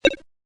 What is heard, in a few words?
blitz, blips